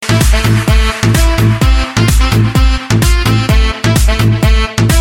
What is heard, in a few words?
Nice Sms